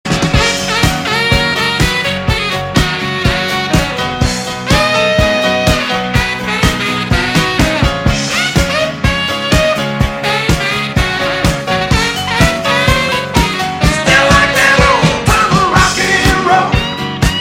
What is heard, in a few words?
saxophone solo